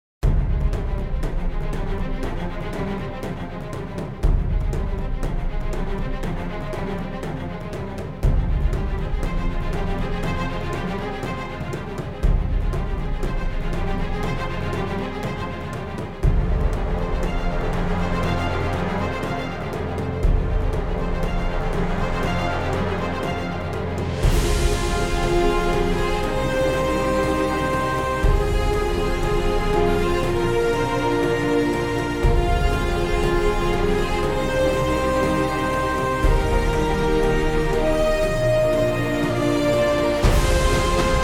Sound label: video games blockbuster